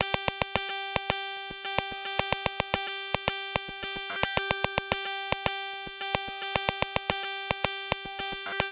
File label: psr 37 beat